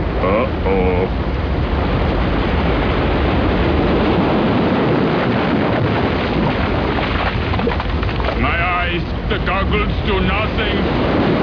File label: goggles